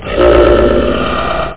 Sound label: Power; hybrid-sample; words